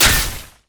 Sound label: basicattack; LoL; varus